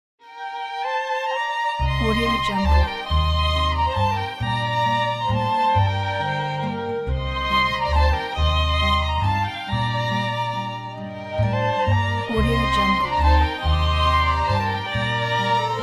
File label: polish jewish ident